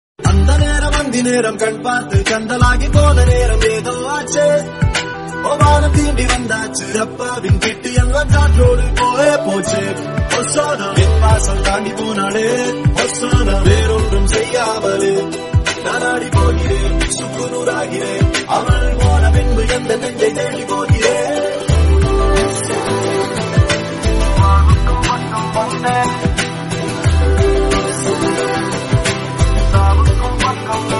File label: hosana instrumental